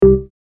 erreur; energie

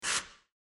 gaz; energie

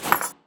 plateau

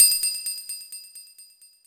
ting; sante-social

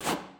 deplacement; sante-social

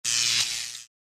electrique; energie

